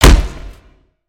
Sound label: Spider Get Hit; Lego; aronwk collection; ndaudio; creatures mechanical